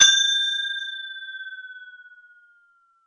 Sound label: Processed Ethn Perc, ETHNIC and WORLD PERCUSSION